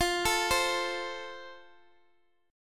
F Major, Guitar DADGAD

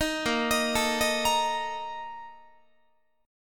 Bb Major 7th Suspended 4th, Guitar; BbM7sus4 arpeggiated

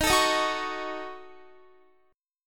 D sharp Minor 7th Flat 5th D sharp, down, Guitar; D#m7b5; strummed; 4E,4A,5D,5G,5C,6F; chord shape

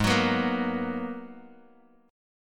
Ab Minor 9th down Bass Standard; strummed; JGuitar

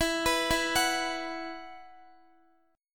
E Suspended 2nd, Guitar; Esus2; arpeggiated; x,x,16,16,16,14

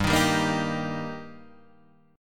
G Minor Sharp 5th G, chord

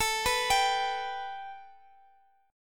A 7th Suspended 2nd, A, down, Guitar, Open D

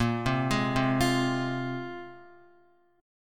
Bb 7th Suspended 2nd, Guitar; Bb7sus2; arpeggiated; x,1,0,1,0,1; JGuitar

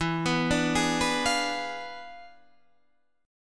E 7th Flat 9th Guitar Standard, chord; E7b9; arpeggiated

